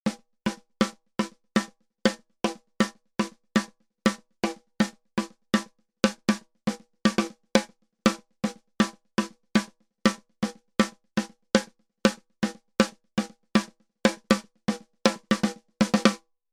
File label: SSL 611 E DYN SD Gate